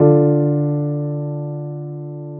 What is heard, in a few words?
chord Dm